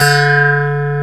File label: RISEING, SYNTH; AKAI S-Series CD-ROM Sound Library VOL-2